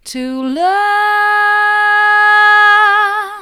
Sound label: to love mid, vocal